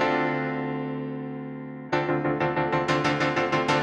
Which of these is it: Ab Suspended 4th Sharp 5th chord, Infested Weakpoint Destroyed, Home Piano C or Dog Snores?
Home Piano C